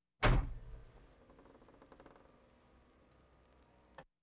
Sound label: Automobiles various; Valentino Production Sound Effects Library CD50